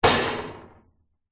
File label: household metal fireplace guard door impact; zapsplat